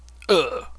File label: pain; twitch-1.0